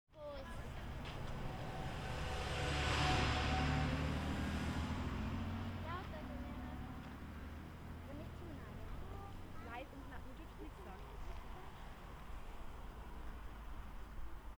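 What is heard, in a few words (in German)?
strassenlärm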